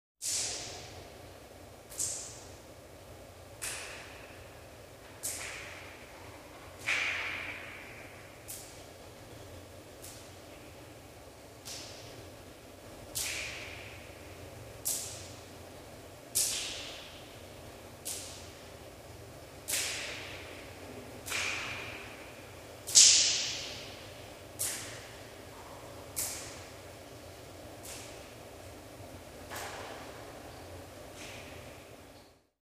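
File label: water dripping in a public shower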